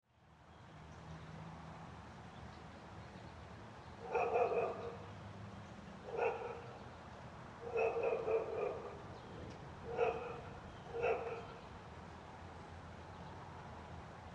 Weather Neighborhood After Rain Storm Ambience

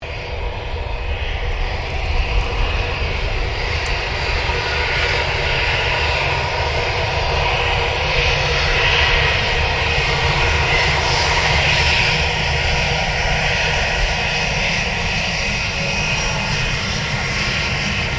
AIRCRAFT A320 AIRBUS TAXI PA; Airplanes

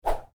Woosh, Whoosh: Short, Light; Whooshes; Sound Design; Stuart Duffield

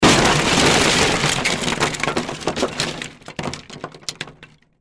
Crash Wood Debris; Materials; Sound Effects:Materials; Blastwave

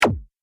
Game App Hit; Video Game Sound Effects

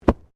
Body movement Tumble Light

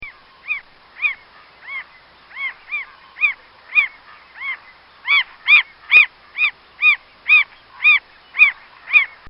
Mockingbird Cry; Animals

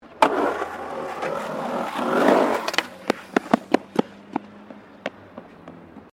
SKATEBOARD SKATE BOWL COME OFF; Skateboarding; Sports

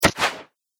Archery Longbow; Bow And Arrow Sound Effects; combat sound effects

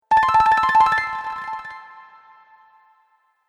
Games Win Sound, video game

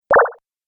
Laser Switch Button

